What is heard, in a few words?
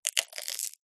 human ice cream cone eat bite crunch